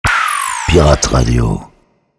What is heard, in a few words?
sweeper medium